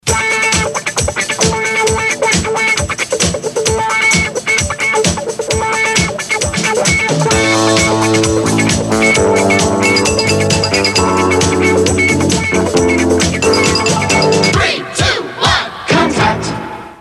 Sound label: Boom Chicka Wah Wah